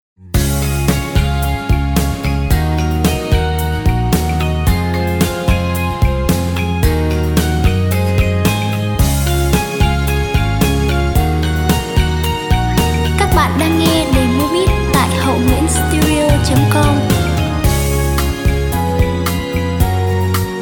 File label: beat, playback